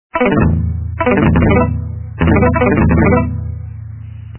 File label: strum dduudu